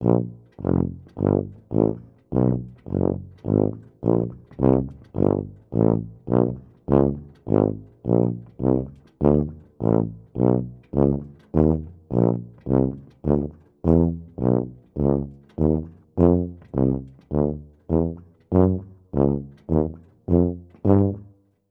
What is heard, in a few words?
Band Practice; Reality Bytes